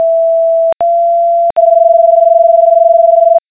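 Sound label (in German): zwei töne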